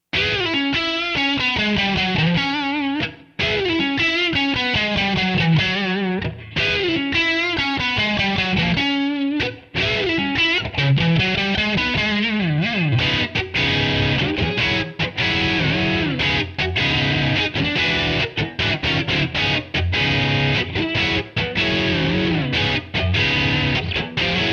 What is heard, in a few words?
C sharp minor riff Line 6